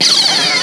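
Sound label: electric guitar noise; E-MU Producer Series Vol. 8 – Platinum Phatt; MoPhattPerc&Kits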